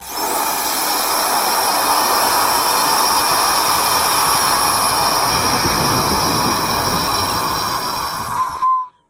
windy storm

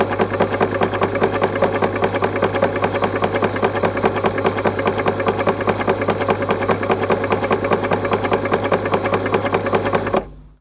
sewing machine

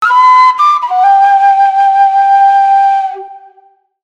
whistle g ornament

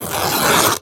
fabric rip

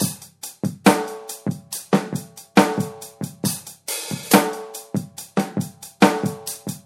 slow jam drums loop

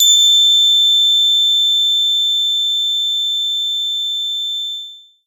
triangle

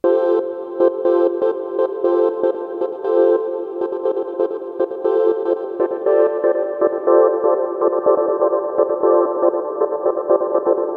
chopped up synth 120

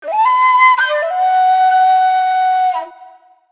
whistle b

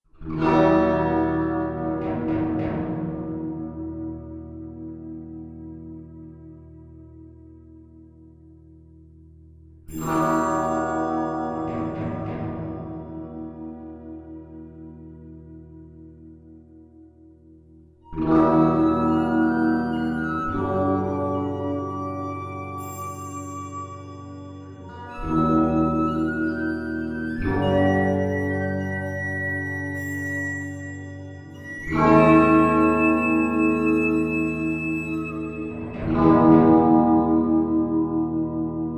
western

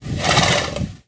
snort

